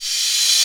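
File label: D2 crash, crash reverse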